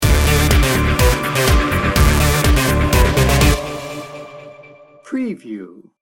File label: ya wanna go sting bumper